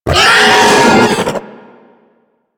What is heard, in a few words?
Infested Juggernaut Vocal Attack; Warframe